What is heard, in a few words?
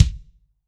KICK, MG KICK